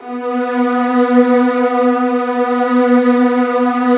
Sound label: B1, Virtuoso Strings